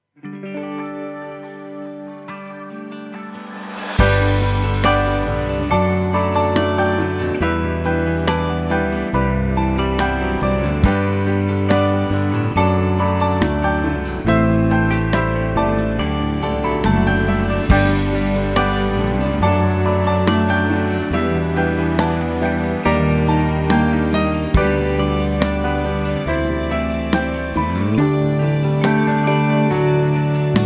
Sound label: Instrumental